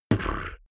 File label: beatbox bass drum tongue roll damped